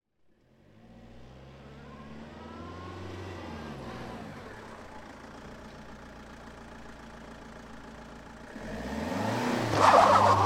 transport car fiat multipla reverse wheel spin away road